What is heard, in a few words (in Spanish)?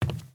Paso zapato madera